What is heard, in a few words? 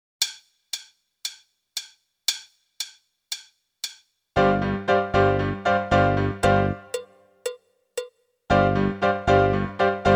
Medium Rock